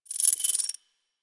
Collar Jingle, Pets; Warframe; Zanuka; Zanuka Companion A

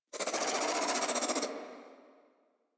Infested Crawler Attack; Warframe

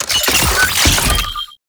Scan Pillar Plant Open; DE; Warframe; Warframes; Frumentarius; ScanAbility

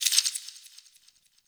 Interface Hover; Warframe; Tau; Somatic Bearer

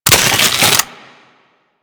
Dart Pistol Reload; Warframe; Weapons; Infested

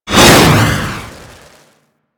Jet Polearm Whoosh, weapons; Grineer

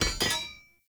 Shield Drop; Weapons; Enemy; Shields; Sinecure Shield

